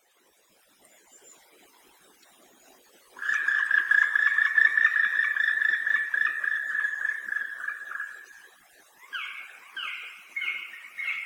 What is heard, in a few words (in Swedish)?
spillkråka; fågelljud